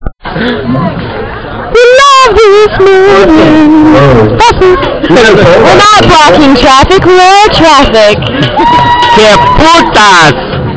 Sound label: beer drinkers make random sounds